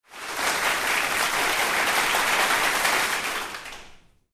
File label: correct